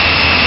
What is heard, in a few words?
engine, tank; beehover